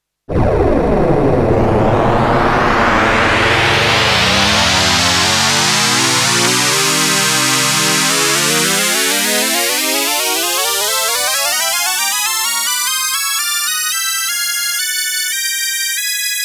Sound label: Warp